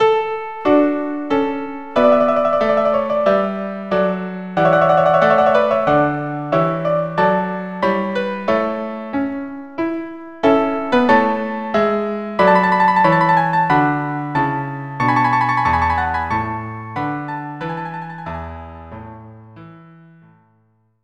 solemn march

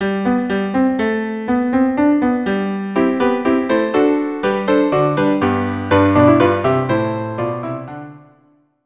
Hornpipe; Purcell

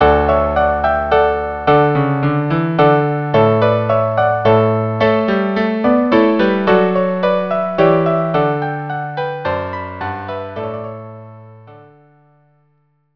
minuet; Gordian Knot

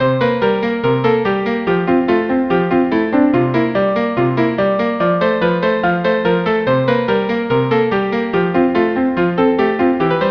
viola duo

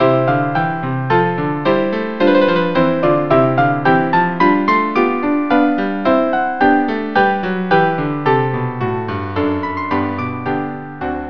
minuet in C; mozart

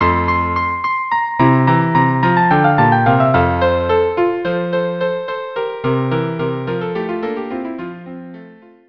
intrada della Chasse

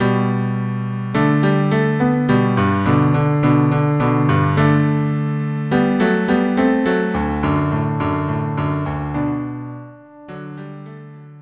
andante cello duo

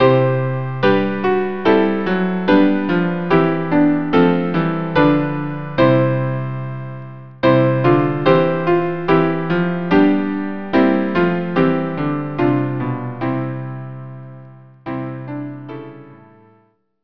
chorale

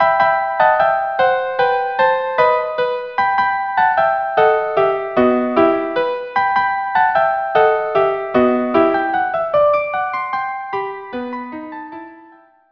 violin duo

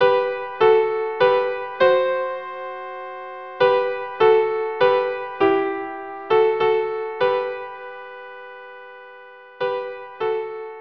violin duo; Finlandia

